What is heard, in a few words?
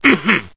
clear throat; chronos